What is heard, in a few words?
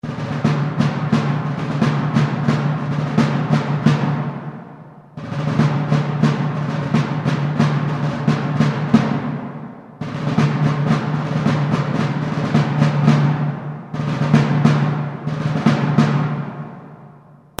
Drumcall